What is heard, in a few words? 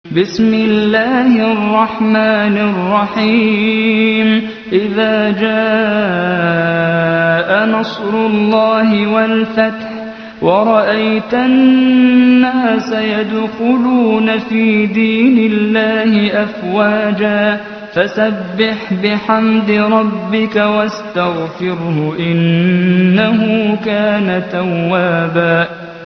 pray; cs ncruins2r